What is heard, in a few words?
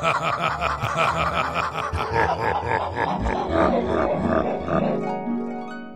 Creepy orc laugh